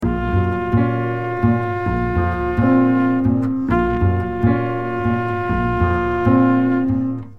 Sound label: drums; four measures of swing (2-feel for the melody and 4-feel for solos), followed by the last four-measure syncopated rhythm pattern